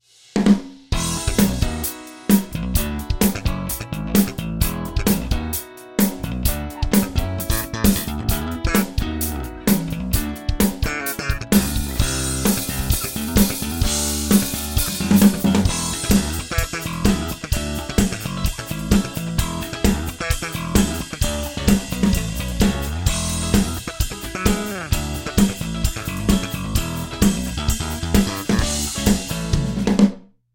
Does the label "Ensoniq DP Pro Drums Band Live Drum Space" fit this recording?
Yes